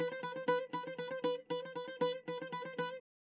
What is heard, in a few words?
bouzouki